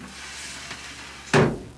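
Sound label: door close; WAV Sound Effects; houshold; bathroom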